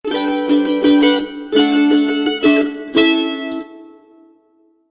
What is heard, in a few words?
Happy Mandolin Celebration; MIUI12